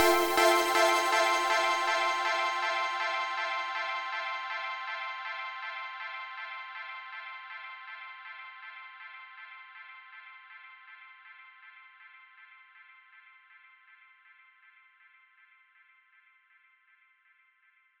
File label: Sting